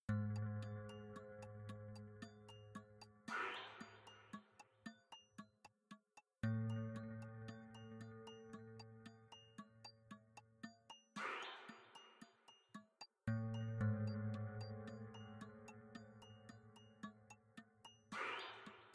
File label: jungle Temple; PhoenixCraft-1.16.5; ambience music